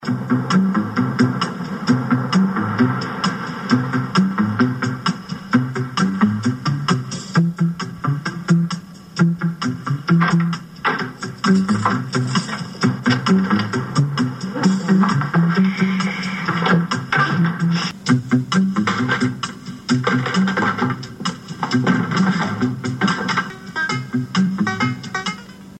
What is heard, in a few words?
funny theme